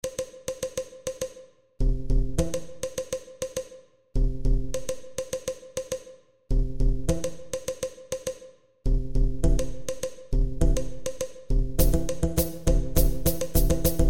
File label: Latin Rhythm; Party